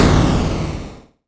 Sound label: Game Sound